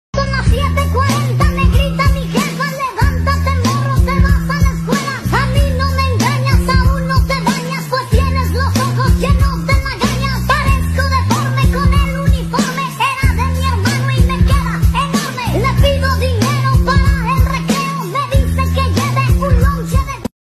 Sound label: Rap